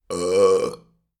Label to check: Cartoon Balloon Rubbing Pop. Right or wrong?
Wrong